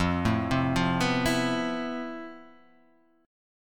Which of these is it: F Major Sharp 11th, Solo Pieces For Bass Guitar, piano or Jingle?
F Major Sharp 11th